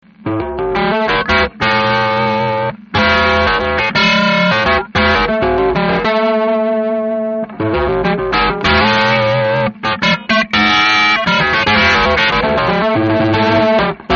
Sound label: ring mod